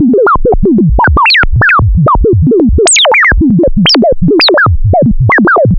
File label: random sine; Zero-G Ambient 1